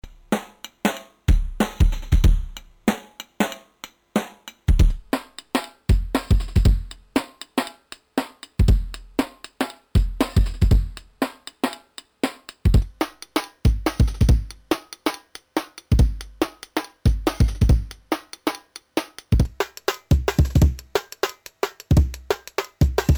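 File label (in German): Emax 10 kHz übersteuert Drum loop Transponieren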